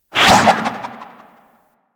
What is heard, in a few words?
Grapple Throw; Warframe; Warframes